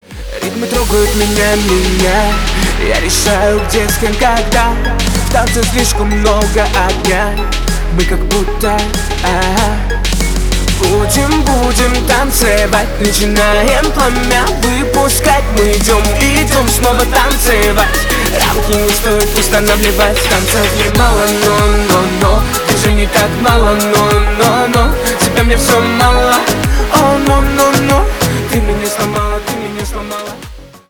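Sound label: ritmi, rhythms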